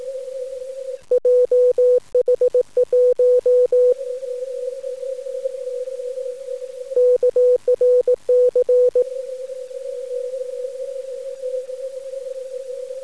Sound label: 15m qrp